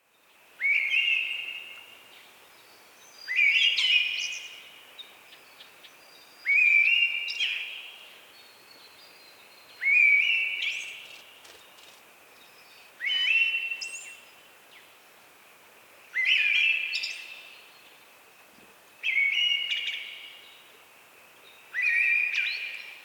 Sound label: Bassian Thrush Waratah Flat